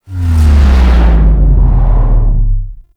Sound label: LFE; Warframe; Nineteen Ninety Nine; Quests; Mall Outro